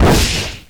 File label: slash; Monster girl quest Part1,2,3; Visual Novels